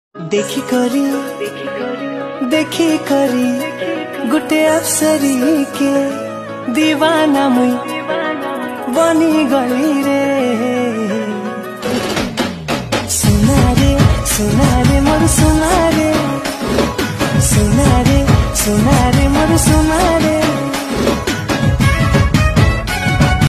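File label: bhojpuri ringtone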